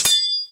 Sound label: Roland R-8 Katana